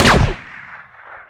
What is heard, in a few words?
blaster pistol